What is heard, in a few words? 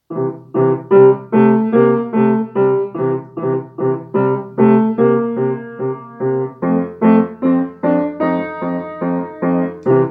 Indian Rock n Roll; Pavel Krejci